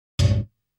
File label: bass drum dry; Sound Sampling Collection VOL-5; Drums & Bass Fusion Funk Effect